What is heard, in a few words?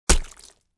Cartoon splat